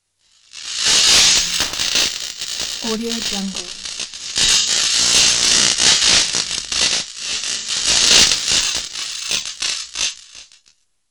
searing electrical burst

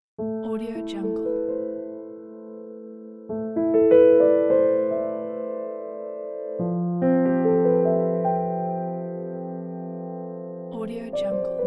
tenderness, piano

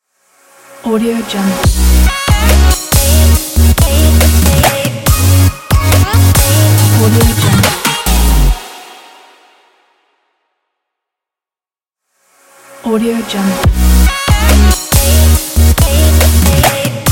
future bass logo

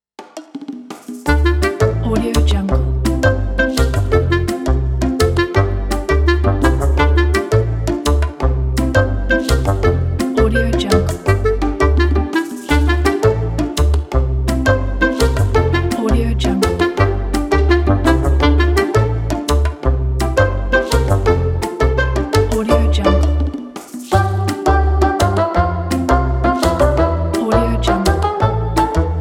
comedy quirky

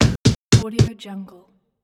knock on door

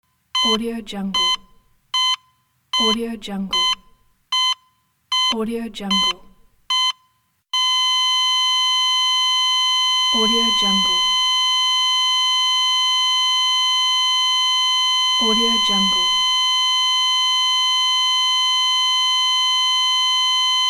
hospital monitor heart failure

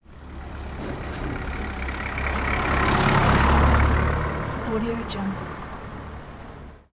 truck

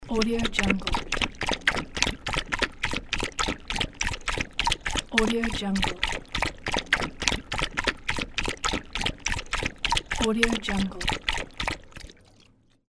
dog drinking water

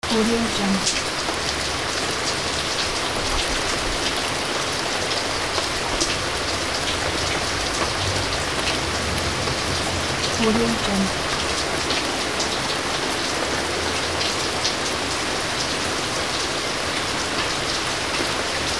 rain

